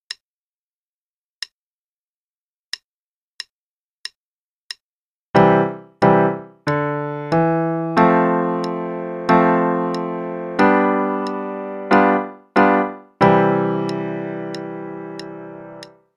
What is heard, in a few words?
Chord Jumps, qn=92; Level 2B - 2nd Ed